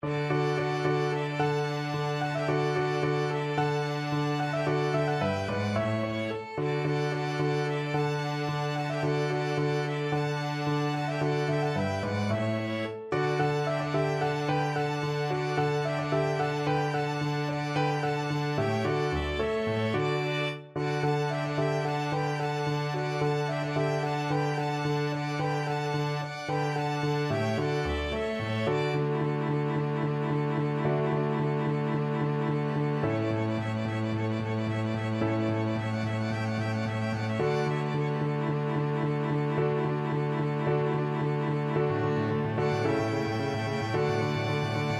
spring, piano quintet

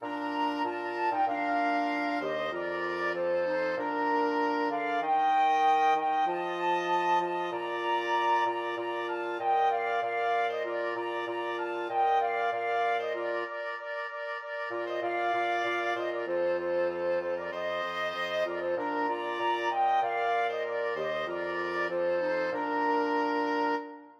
flexible mixed ensemble, 4 players

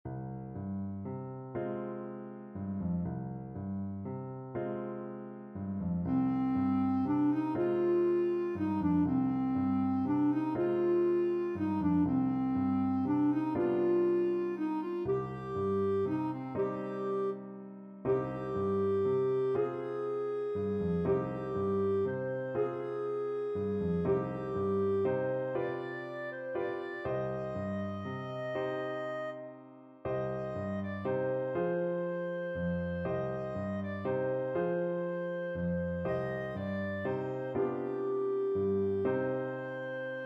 clarinet; hashivenu

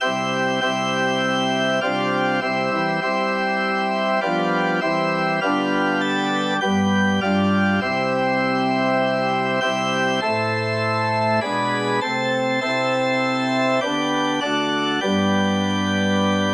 it is well, organ

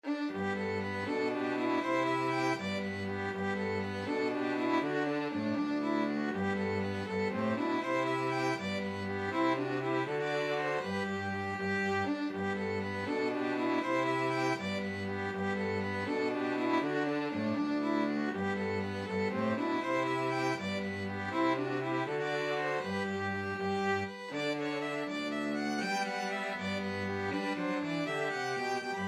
merry christmas 2 violins cello

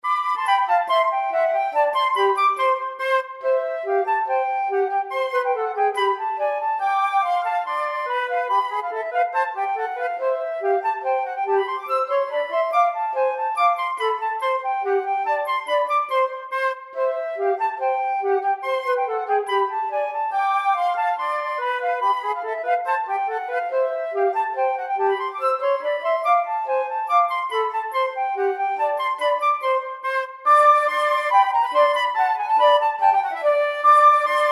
Jesusita en Chihuahua, flute duet

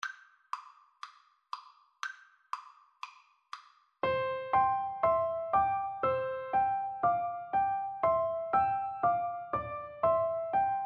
Piano Duet

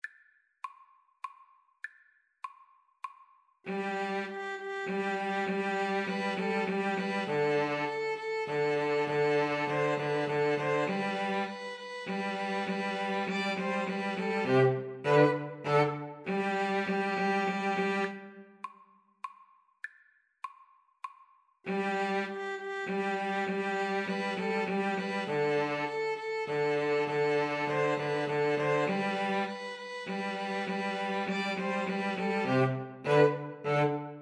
fanfare, 2 Violins Cello; corrette

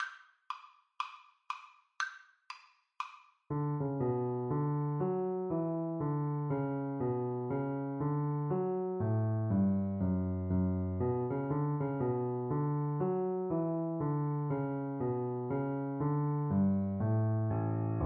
bouree, Cello; bach

